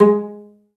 Cell Pz G#3; Digital Sound Factory Virtuoso

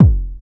BD, BD Dance; Sound & Vision; Gigapack I CD 1

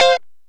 Lounge Guitar Chick Major F3; Zero-G Kocktail Kollection

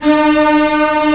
STR A1 FF, Orchestra; Sound On Sound; Peter Siedleczek's Orchestra

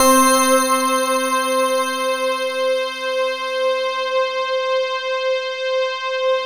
SY99 CRYST, BELLS; Best Service; Alpine Volksmusik

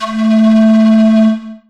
ethno, ethnic; Techno Trance Essentials

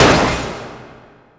hit, IMPACTS & HITS; Techno Trance; FX & ATMO; Techno Trance Essentials

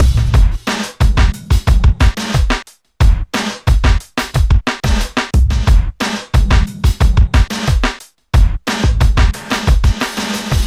punch up 090bpm; Zero-G; Downbeat Dance Grooves

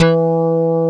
PULL BAS

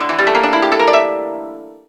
Koto Up; SmallKoto; Ethnic

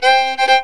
FID TAG

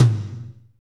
tom, fast shuffle, Roland

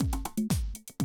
Classic Drum Loops

